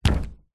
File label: footstep single flip flop on metal external platform floor; foley; zapsplat